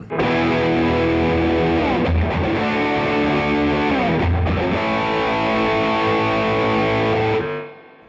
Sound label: noise